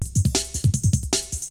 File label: BREAKBEATS, TECHNO